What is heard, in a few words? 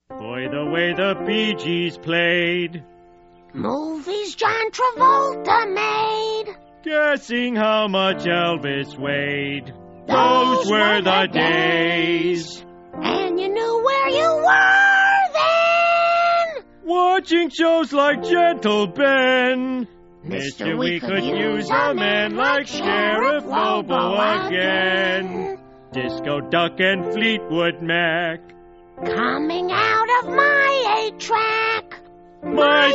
sax medley; lisas